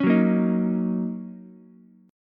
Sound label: D sharp Minor 7th, Ukulele; strummed; JGuitar